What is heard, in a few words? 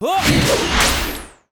attack; Commando; Mob